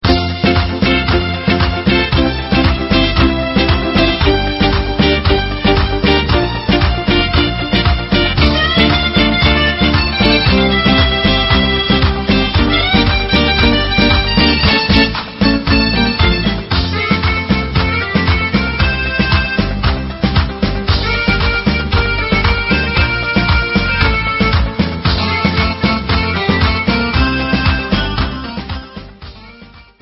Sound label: Instrumental